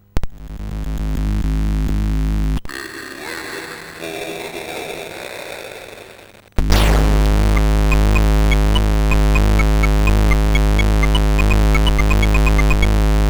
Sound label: MOS 6581; SIDBench